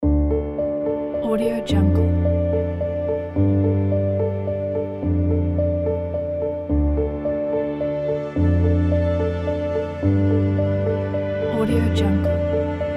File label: beautiful cinematic piano